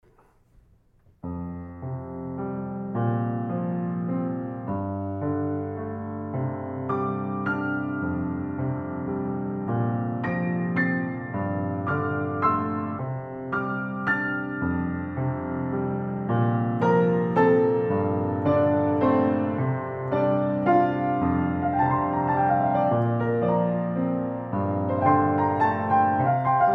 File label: Perfect 9ths Improvisation